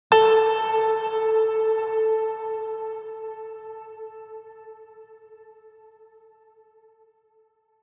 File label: Trailer Tools Piano Ping; Production Music; CATastrophic